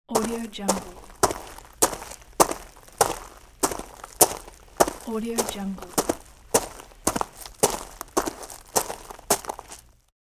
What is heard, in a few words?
footsteps on gravel running and walking